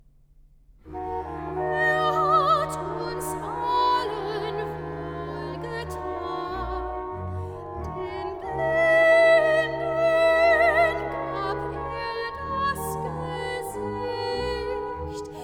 Recitativo; Bach Matthew Passion; Er hat uns allen wohlgetan

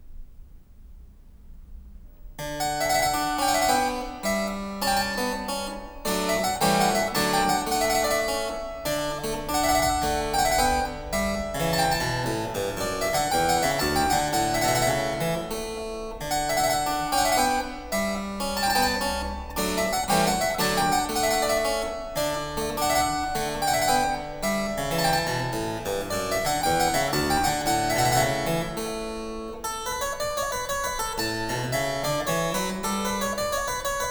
Harpsichord; Blandine Verlet; Bach; Complete Partitas for Harpsichord; 巴赫羽管键琴组曲全集

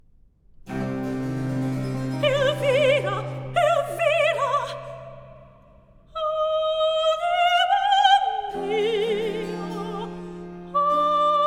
Cantata for soprano continuo Recitativo; Elvira anima mia; RV 654

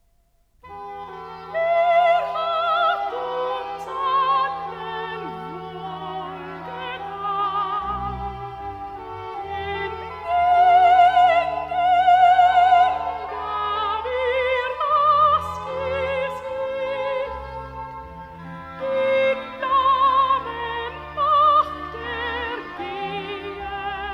Recitative (Soprano); Er hat uns allen wohlgetan; Bach Matthäus-Passion BWV 244; Karl Richter (conductor)

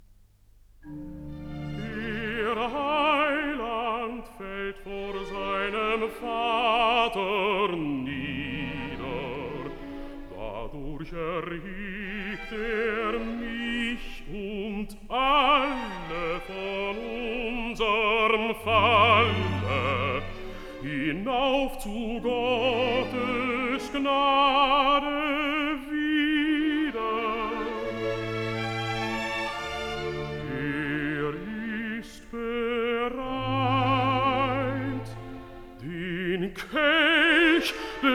Recitative (Bass); Der Heiland fällt vor seinem Vater nieder; Bach Matthäus-Passion BWV 244; Karl Richter (conductor)

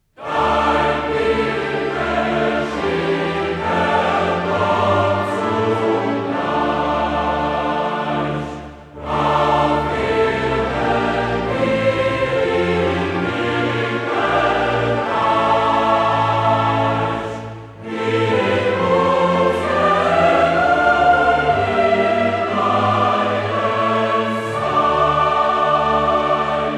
Chorale; Bach Johannes-Passion BWV 245; Karl Richter (conductor); Dein Will gescheh

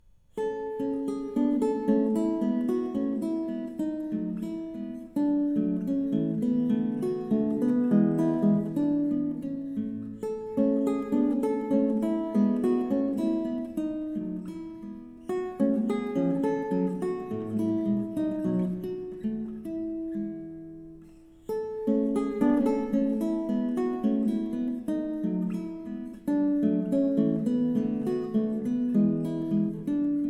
Studios for the Spanish Guitar, Andante Allegro; Op 6 - No 2 in A major; Fernando Sor Early Works

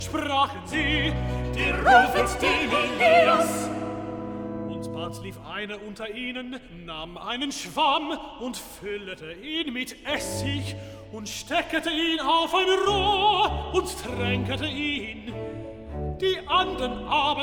Chorus, Bach Matthew Passion; Der rufet dem Elias Evangelista Und bald lief einer unter ihnen

